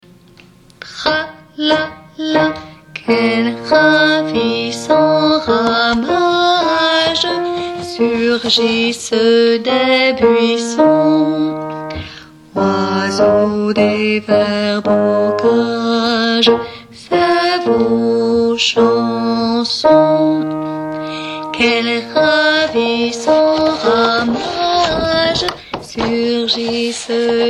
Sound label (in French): fanfare basses